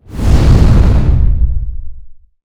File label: woosh